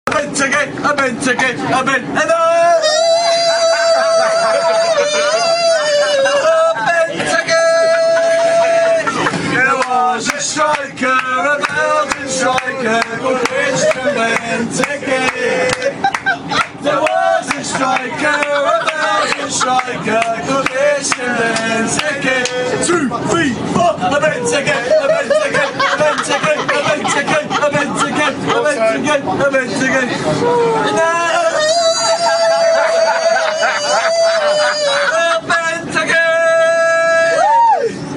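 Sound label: chant, football chants; christian benteke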